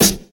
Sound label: natural acoustic snare sound f sharp key